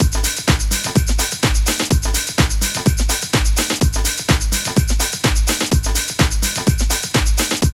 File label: LOOP, Tekno Industrial; East West